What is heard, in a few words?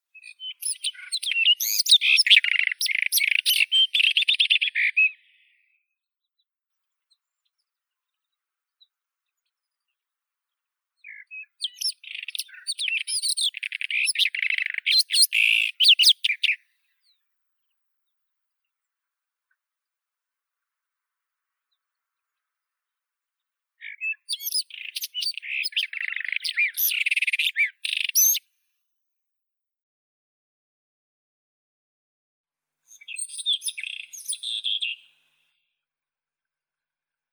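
white-throated robin, Irania gutturalis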